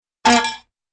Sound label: bonk and cowbell